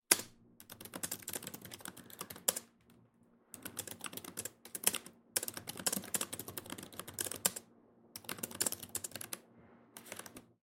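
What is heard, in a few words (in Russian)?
печатаю на клавиатуре моего компьютера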